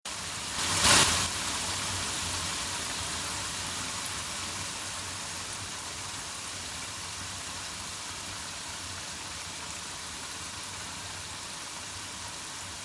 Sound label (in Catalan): fregir